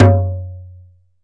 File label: ROLAND SPD-20 PERC TABLA